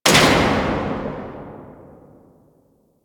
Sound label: Flood Light Switch On; Nineteen Ninety Nine